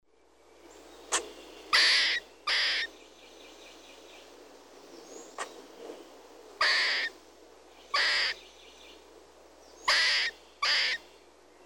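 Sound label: voice squirrel; belka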